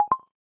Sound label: Cable Connect